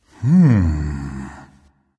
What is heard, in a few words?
thinking, voice; Smite; ymir skin12